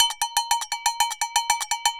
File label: AGOGO, DRUMS SAMBA; Best Service ProSamples vol.14; AKAI